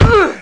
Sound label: Small Impact